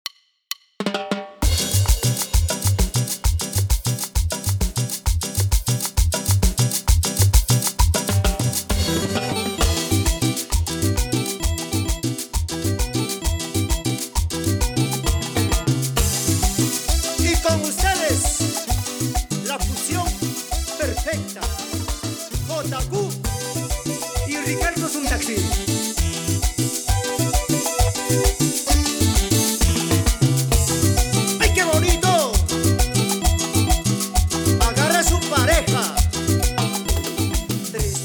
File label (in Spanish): Intro Perc 132 BPM